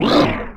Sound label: Attack; SSBU; Bowser